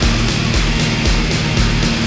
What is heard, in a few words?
drumloop Eb